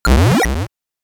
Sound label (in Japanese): 8bit上昇音 効果音